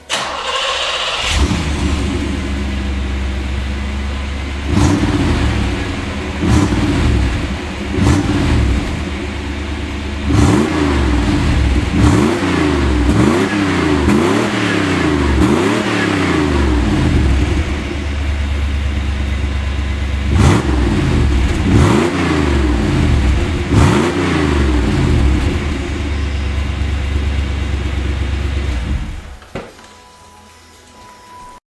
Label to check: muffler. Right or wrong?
Right